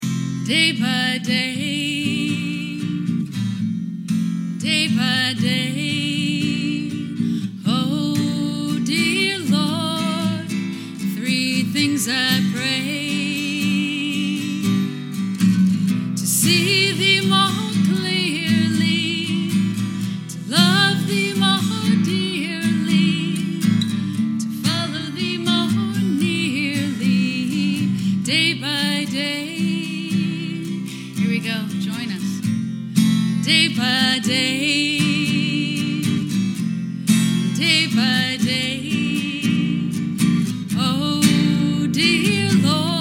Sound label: acoustic